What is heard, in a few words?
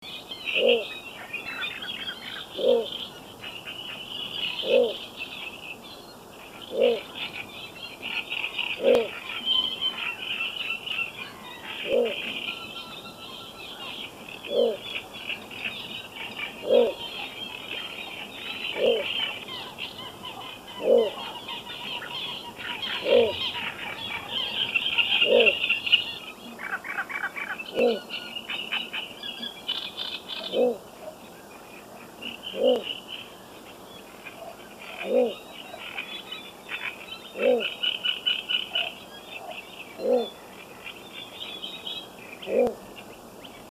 Ixobrychus minutus; aves